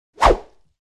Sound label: Fast swish whip bamboo stick